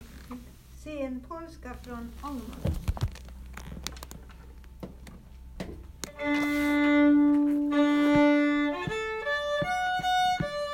polska, Ångermanland; Senpolska

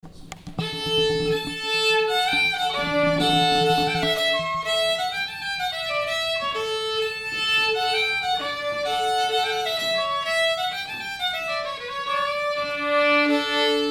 polska; Kristian Oskarsson

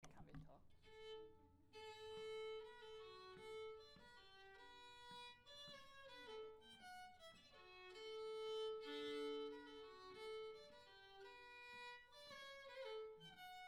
Polska; Johan Högblom

